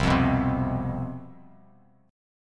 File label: C Major 7th Suspended 4th, down, Bass Standard; strummed